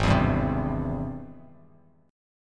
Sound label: Ab Augmented Major 7th Ab; strummed; 4,7,5,5; JGuitar